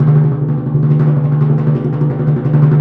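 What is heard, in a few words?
timpani roll; Roland LCDP03; Timp Rol Dry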